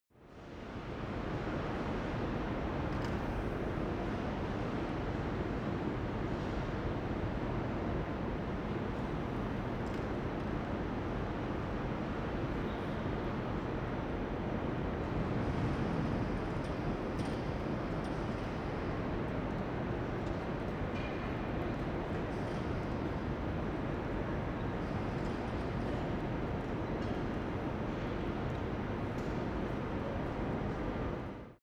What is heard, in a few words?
train main station